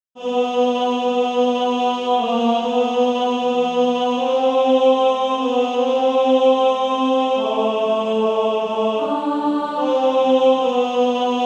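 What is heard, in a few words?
Male Choir, tenor 1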